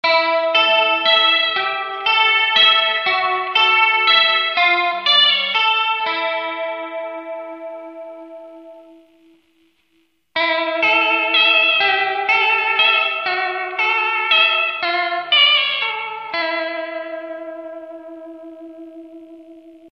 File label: Vibro Chorus